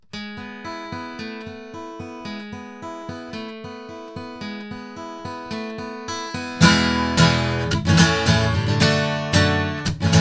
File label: Blue Reactor 8 guitar